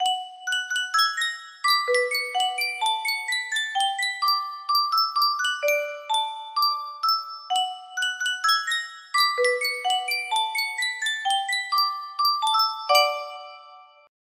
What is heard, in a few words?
Sankyo Music Box, music box melody